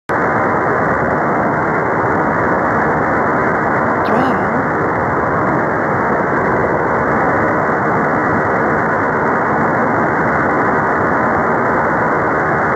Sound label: utility STANAG 4057 kHz; glueckburg